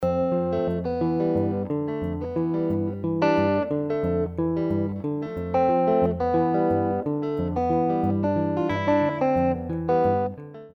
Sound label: Kwaw sample, rhythm guitar samples